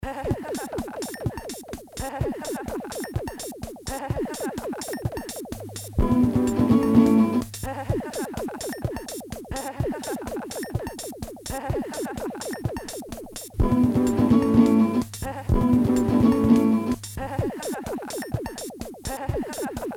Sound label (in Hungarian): casio; matula records